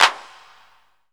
CLAPS HIP, CLP

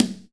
HI CONGA, CONGA; AKAI S6000 CD-ROM - Volume 5

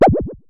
Synare Filter; Star Instruments Synare 3

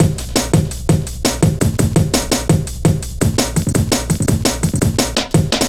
Step Ez, Drum Loops, Jungle, 168.5; Zero-G Jungle Warfare 1, 2 & 3

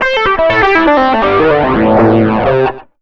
SOLO R, Electric & Acoustic Guitar Loops

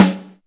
SNR PRO; Back In Time Records - Big Bang

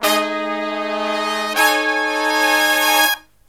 SLEEP, Killer Brass Riffs

